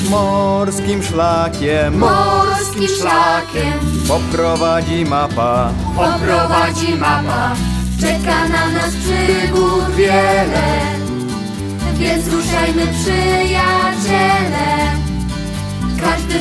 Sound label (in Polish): wersja wokalna